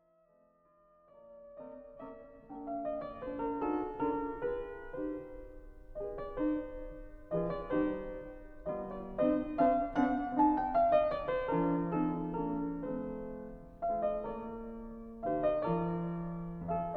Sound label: Sonata In E Flat Major Andante